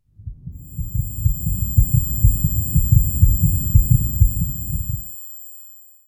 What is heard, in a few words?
tinnitus; S.T.A.L.K.E.R.; IWP